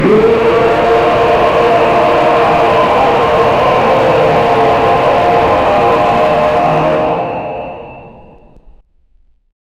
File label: a massive discordant roa